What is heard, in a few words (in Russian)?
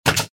zabivanie gvozdja perforatorom